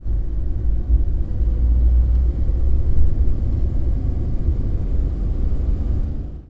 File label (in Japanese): 自動車運転